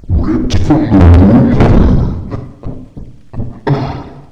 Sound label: womb